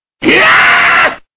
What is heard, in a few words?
yeaahhhh